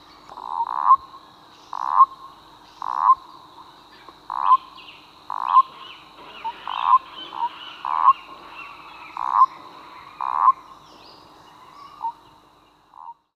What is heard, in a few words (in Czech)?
hlas zvuky zvirat